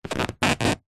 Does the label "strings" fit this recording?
No